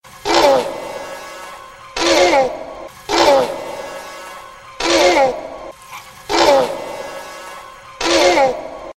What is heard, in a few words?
velociraptor calls